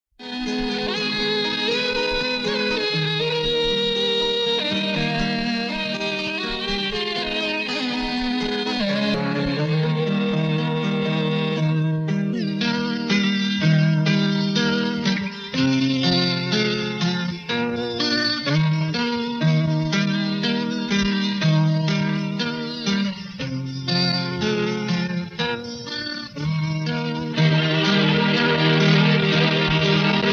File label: Instrumental